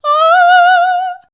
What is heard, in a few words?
F5, Voice